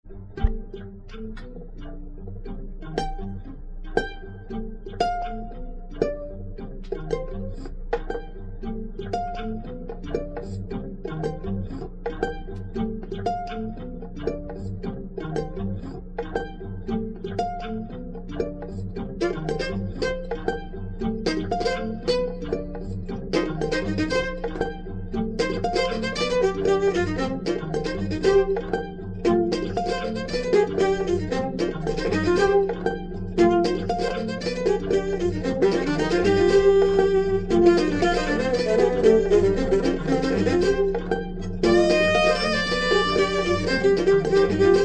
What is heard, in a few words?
Violin Swag; Funk Jazz